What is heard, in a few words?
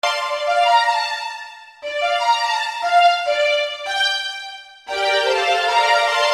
String